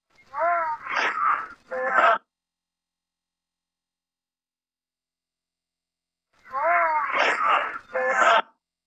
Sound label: vocals